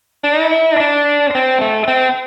Lick

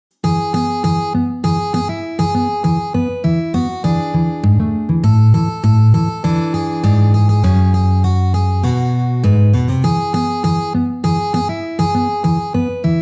Jingle Bells Hard Normal

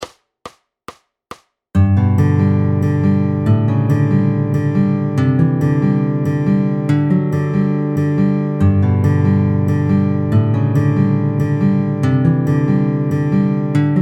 Fingerstyle Movable Chords G

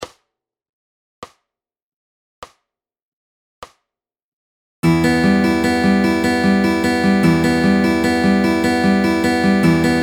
Fingerpicking Pattern E minor; Fingerpicking Fun

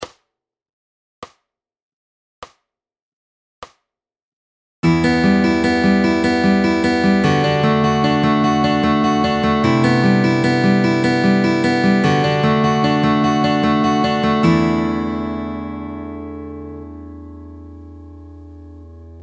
Fingerpicking Pattern All Chords; Fingerpicking Fun

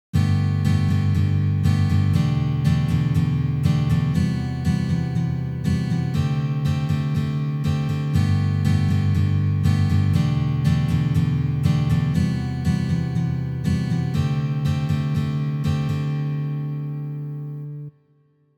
In Focus Ex. Complex

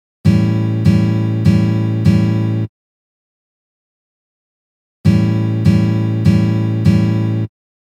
Blues Guitar Strum Pattern

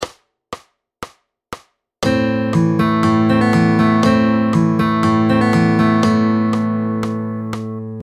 Fingerstyle Picking Pattern; Fingerstyle Fun; P3 Supercharging a Picking Pattern

